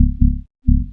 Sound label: bass; recreational research foundation